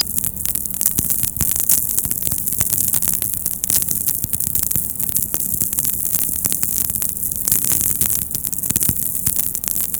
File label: Clicks